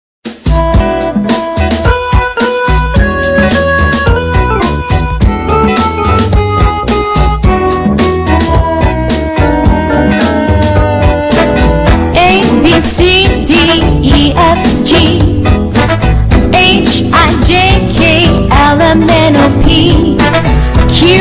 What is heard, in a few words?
ABC Funky